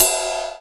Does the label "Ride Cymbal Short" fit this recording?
Yes